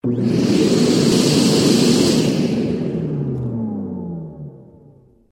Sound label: vacuum